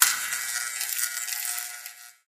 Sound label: light flicker end; Source Engine; Portal 2